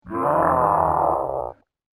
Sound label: Troll Growl; Monster Sound Effects; fantasy